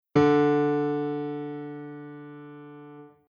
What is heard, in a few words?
tenor low scale